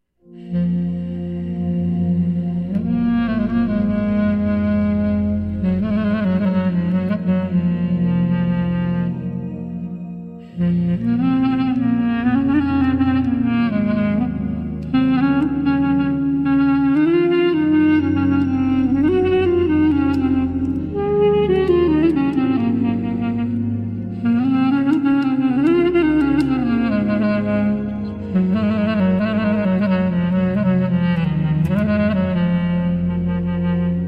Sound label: CLARINET